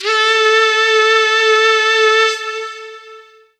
lead syn, Techno Trance

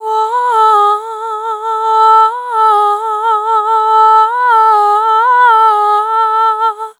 Servitude Dry, vocal samples; Sonic Foundry (Sony Creative Software) - Pocket Diva World Class Vocal Samples; Op-Erratic Loops

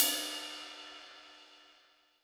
crash, Zoom MRT-3B

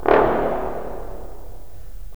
BRS, Roland LA Composer

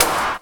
SD, 170 BPM; Ueberschall; Drum & Bass Resonance

